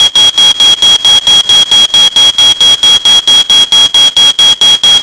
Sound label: Smoke Detector